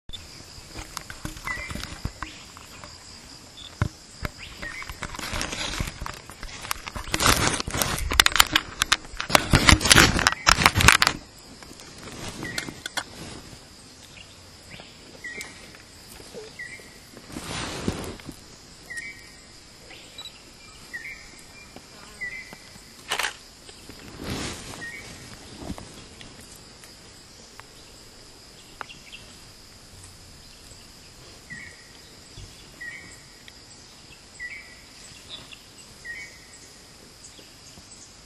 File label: periyar, soundscapes; Watching Elephants in Periyar Wildlife Sanctuary in Kumily, Kerala, India